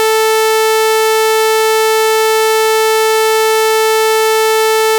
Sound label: sawtooth